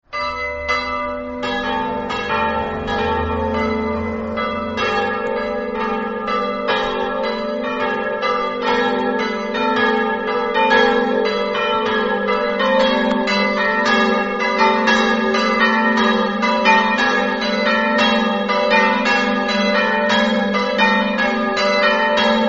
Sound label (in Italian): cortale, campane